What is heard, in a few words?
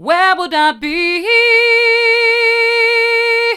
where would i be hard, vocal